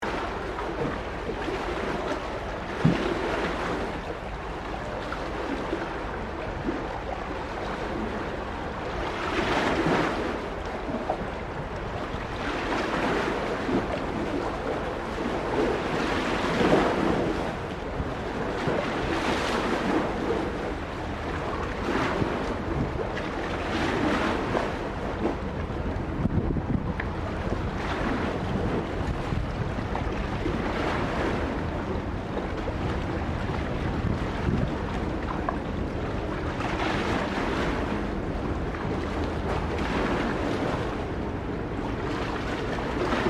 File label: Water crashing against docks; deptford docks